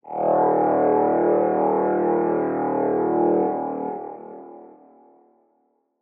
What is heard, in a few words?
Pad; Dubstep Sample Pack